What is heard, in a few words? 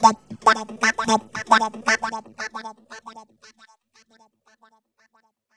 BEAT FX, Funky Vocals; USB Soundscan vol.18; AKAI; TB BEAT